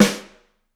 Rim, drum

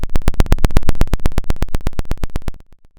pop, Sound of a dinosaur

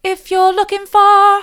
soft, vocal

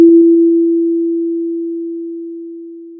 Sound of a church bell

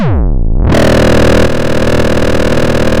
Sound of a chainsaw

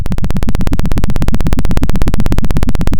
pop, Sound of a helicopter

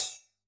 Hf Hat, drum

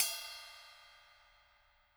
Trash

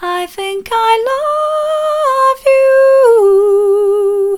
soft, vocal

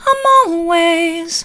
i'm allways soft, vocal

